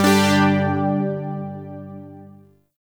Shawzin Chromatic Chord; Zariman Shawzin

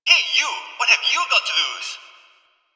Gambling Machine Bark; Warframe; Ambience; Nineteen Ninety Nine

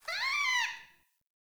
Trapped Beaver Call; Encounters; Animal Rescue

